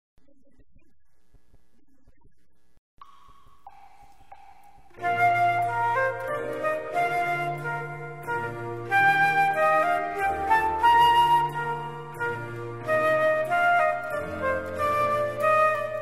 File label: minuet